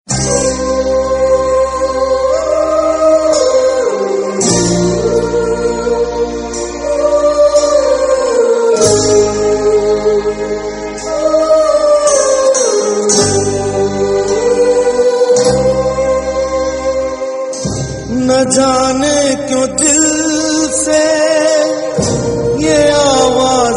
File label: (Sad); Hindi